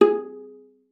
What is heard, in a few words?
VIZP G#4, VIOLA PIZZ; Xsample VOL-5